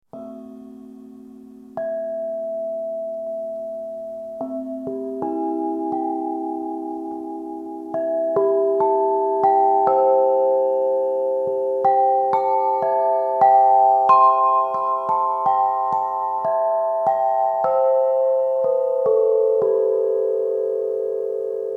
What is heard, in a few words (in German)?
Plattenglockenspiel aus Bronze